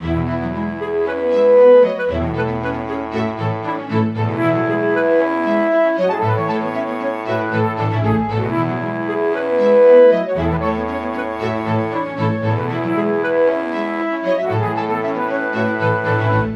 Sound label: example classical